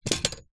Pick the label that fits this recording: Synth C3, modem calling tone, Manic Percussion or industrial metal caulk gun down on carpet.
industrial metal caulk gun down on carpet